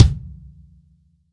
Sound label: KICK, GM drum set; AKAI S-Series CD-ROM Sound Library VOL-8